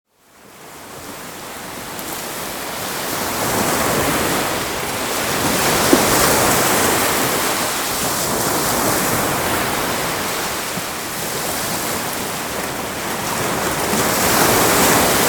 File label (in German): Meeresrauschen; Der Klang des Meeres